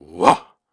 Grunts and Chants, Viking Vocal

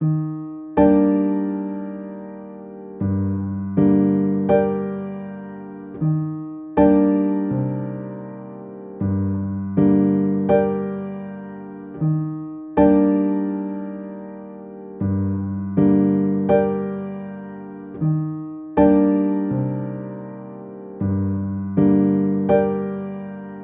80 BPM G Min Upright; Loop Stems; Cymatics; Harmony

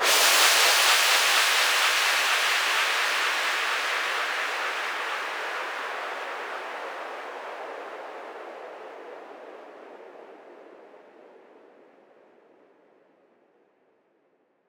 Waves White Noise Down; House; FX

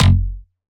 Bass Shot F# Pluck, Dubstep; Ghosthack; Advent Calendar 2018

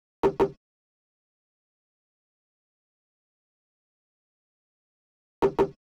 Stomp It Drum Loop 185 BPM Percussion; Cymatics; Mystery Pack Vol 4

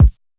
Kick - B; Drum One Shots; Kicks; Cymatics; Eternity Sample Pack

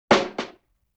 Rim Shot Recoil; Vintage Cartoon; Drums & Percussion

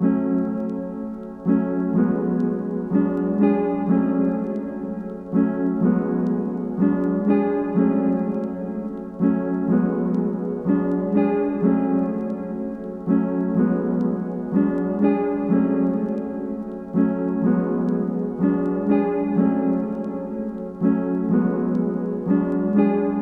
124 BPM F# Min Wet Piano, loop stems; Melody; Cymatics; 2022 Melody Collection Platinum Expansion; Skeletons

